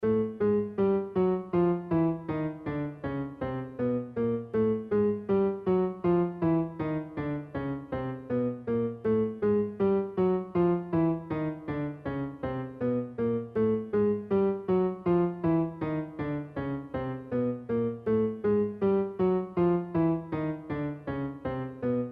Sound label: Strange down